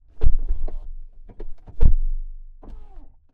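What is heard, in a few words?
ferrari 488 onboard door close double interior; 2016; MKH8020; Pole Position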